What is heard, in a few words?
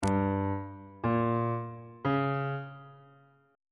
a flat ionian chord 7 is g diminished